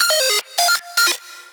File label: synth arp Fm; lead arp chord melody